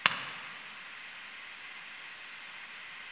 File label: IR MLS18, Impulse Responses